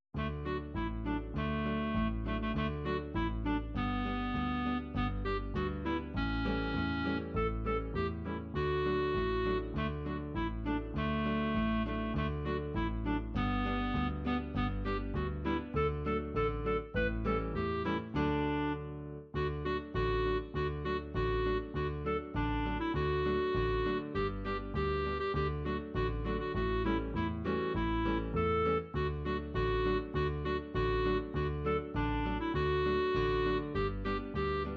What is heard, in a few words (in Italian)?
canto tradizionale di natale jingle bells, midi